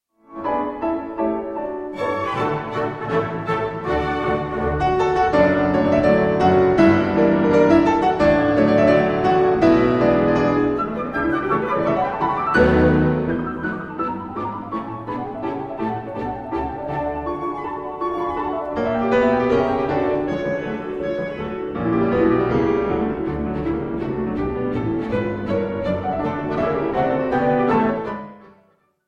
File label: capriccio brillant in b minor op22 for piano and orchestra